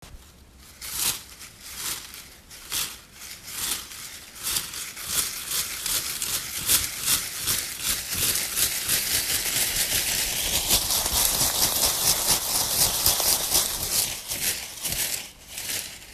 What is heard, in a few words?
Paper Strips